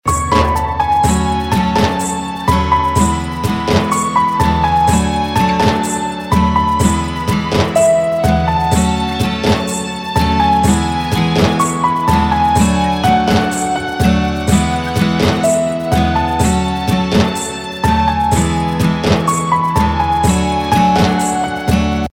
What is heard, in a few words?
Instrumental Ringtone; Bollywood Hits (2014)